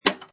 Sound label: Pinball Sound Flipper; Video Game Sound Effects; Video Games (Sound Effects:Games); Lynne Music